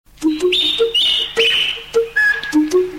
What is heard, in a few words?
Birds Message